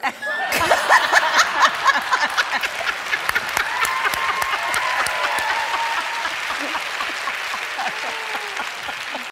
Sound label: cackling; Kamala